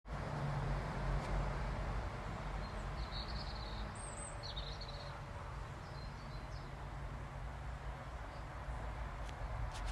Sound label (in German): Voegel hinterm Haus; Bamenohler Straße